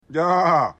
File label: yaaaah; will smith